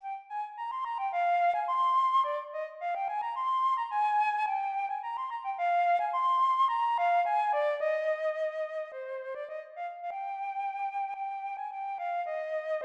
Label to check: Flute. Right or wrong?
Right